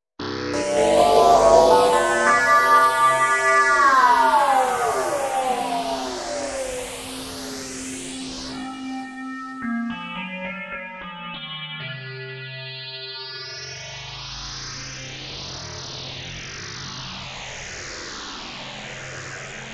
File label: Ring Fm